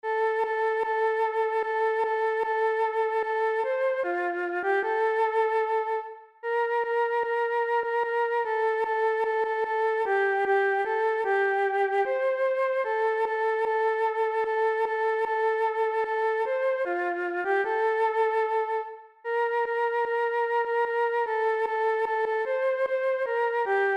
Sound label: Key of F, flute; Jingle Bells